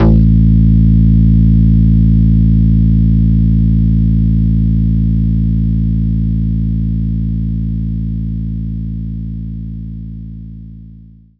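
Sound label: synth bass A; knights try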